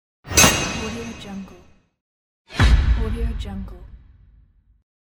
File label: horror hit